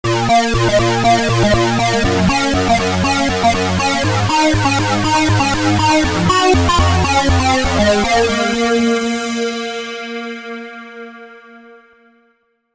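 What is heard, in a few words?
DSS Sync Delay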